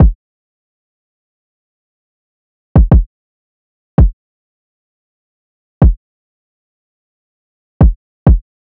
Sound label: Side Drum Loop - 98 BPM Kick; Loop Stems; Cymatics; Vibes II Drum Loop Collection